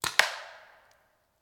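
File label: Paintball Gun Impact; Sound Ideas; Guns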